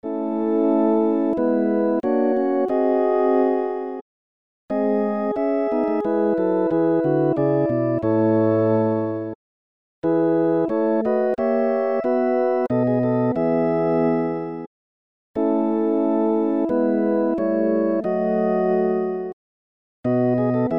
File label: halion lehmann lower manual; Mark Lindley WTC 1; WTC Tuning